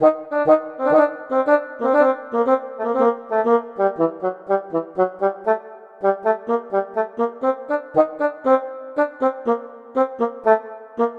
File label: Oboe; гобой